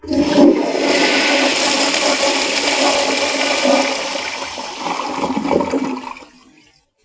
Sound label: toilet